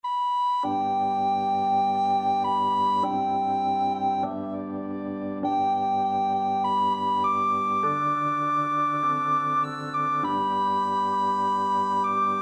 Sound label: swing low, recorder